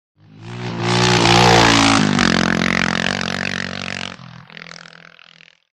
By Trials Bike Fast Up

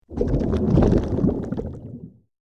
Heavy Underwater Exh, bubbles

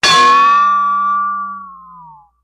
Ding, metallic hit; chimes & bells

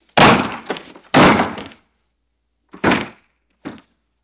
Kick Wooden Door, crash slams

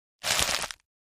Foliage Impact Hard and Crunchy; hits & impacts

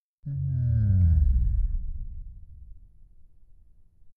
Low Vocal Power Down, servos

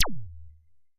laser; lasers

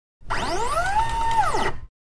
Servo Motor Quick On

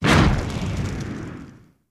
Small Fiery Explosion

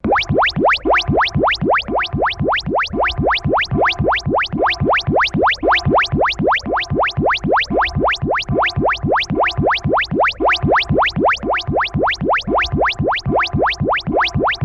Bweep Beep Loop, electronics

